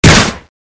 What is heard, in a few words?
speargun